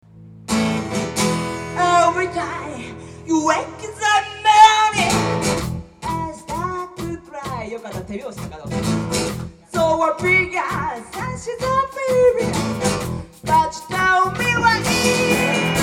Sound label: Blues